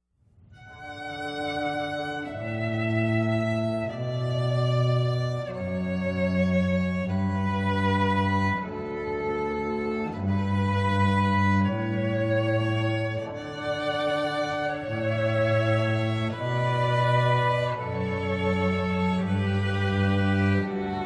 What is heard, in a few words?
Kanon in D Major, String Quartet; Pachelbel; Classical; Heritage String Quartet